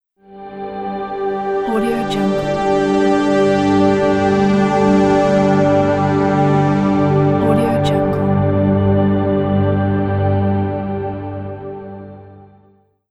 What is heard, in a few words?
intro pad